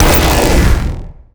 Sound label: tesla lightning, sentry gun; cstrike; CSSB